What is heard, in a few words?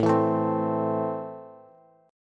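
A Minor, Bass, chord strum; Am strummed; 17,19,19,17; JGuitar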